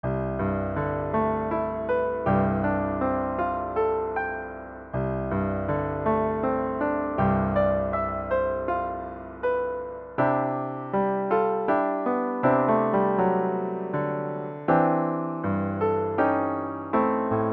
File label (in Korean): 고요한 밤 거룩한 밤, 바이올린; silent